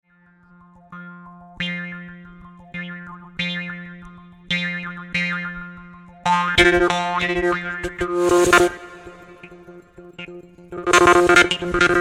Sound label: Morsing, InnovativeJewsHarp